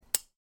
lamp switch

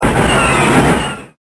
BLASTER

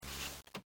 window slide

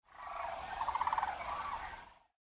water

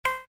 Heart Beep

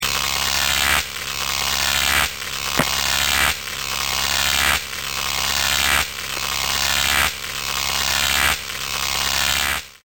Electricity

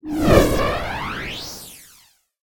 Fire Flyby

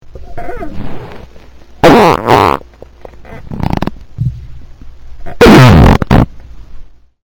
fart

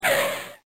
skid

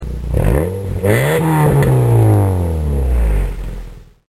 exhaust

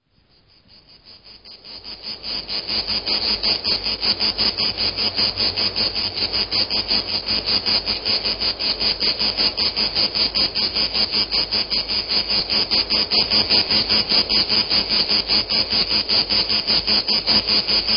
Cicada

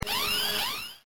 ALARM

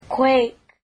quake

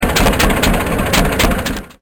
popper

